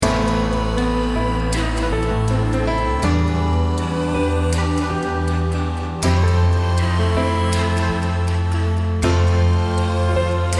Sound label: Healing Melody